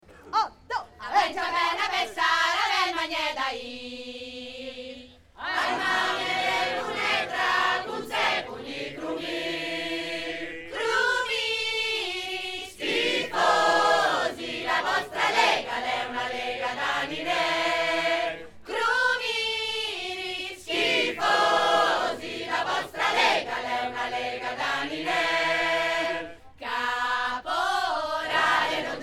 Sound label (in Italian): coro